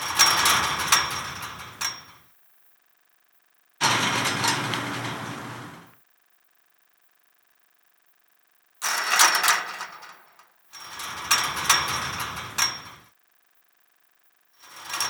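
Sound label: metal chainlink rattle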